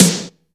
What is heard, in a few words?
EFEX SD, FX DRUM